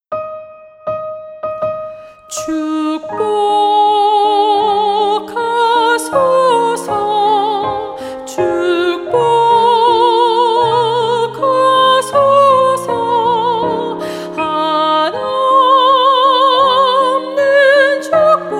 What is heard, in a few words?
ALTO